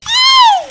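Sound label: spy pain critical death; voice packs; spy jackson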